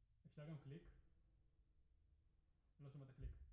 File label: Piano Mono